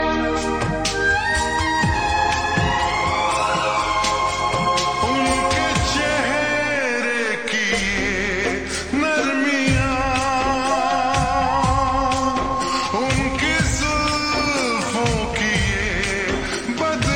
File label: Ringtone Slowed Reverb